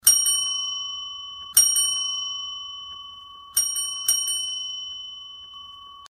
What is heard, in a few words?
desk bell